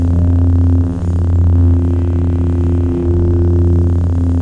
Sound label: saber loop; lightsaber